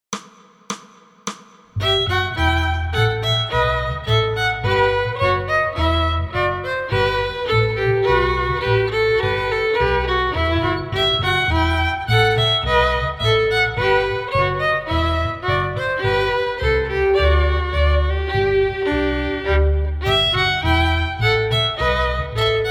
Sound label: Bourree Fiddle 1 and 2 with Bass; Bouree in E Minor